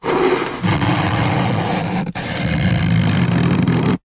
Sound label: cardboard box flap move slow creak